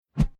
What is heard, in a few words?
Plastic Tube Stick Sword Sweep By Whoosh Airy; Whoosh Sound Effects; Whooshes